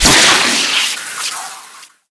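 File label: water pipe burst; Call of Duty Modern Warfare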